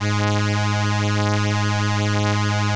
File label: ELKA, String Machines